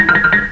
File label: barrel